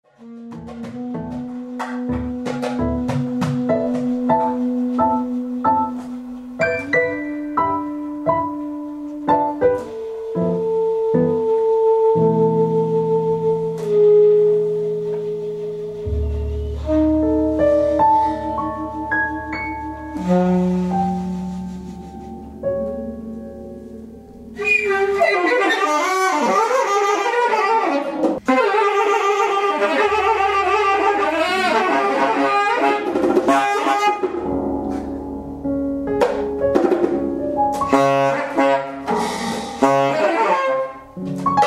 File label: sax session